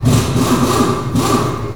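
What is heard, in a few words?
Impact big, air tools, industrial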